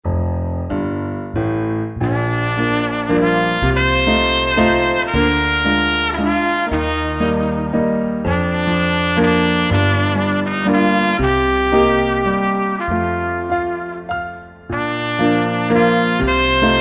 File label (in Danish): Trumpet og klaver potpourri